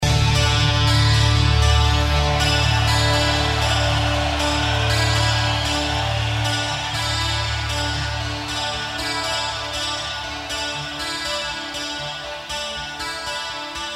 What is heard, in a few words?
instrumental